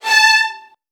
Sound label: VN 16TH, 3A3; ILIO; Kirk Hunter